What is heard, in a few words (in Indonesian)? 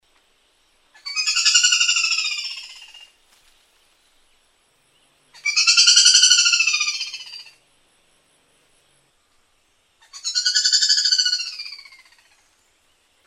suara kadalan sulawesi